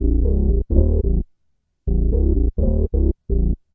dim funk synth bass; BASS LOOPS

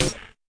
fx drum, Electro FX

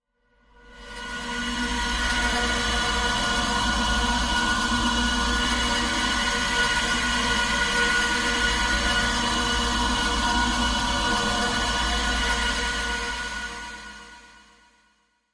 dusty pad; Atmospherics

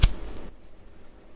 lighter record plain; UNUSUAL KITS and FX

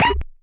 nasty stormfront; Nasty Stormfront Electro FX

